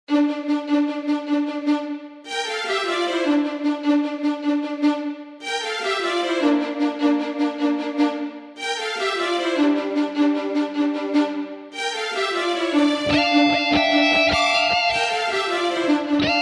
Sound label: orchestral version; Ulysse terrasse le cyclope